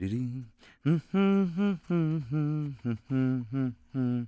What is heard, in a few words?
humming, English Voice; The Sum of All Fears